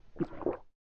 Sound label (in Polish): polykanie ptakobestia; apocalipsis